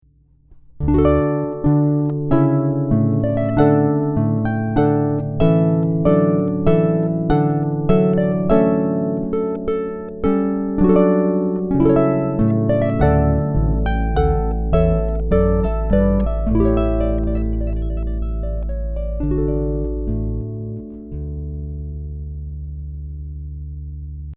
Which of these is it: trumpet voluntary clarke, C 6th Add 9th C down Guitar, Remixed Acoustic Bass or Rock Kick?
trumpet voluntary clarke